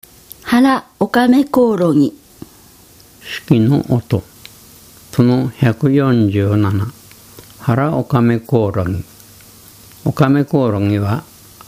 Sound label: okamekourogi; insect; sikioto